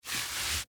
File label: Rope Pull Heavy; Hand Tools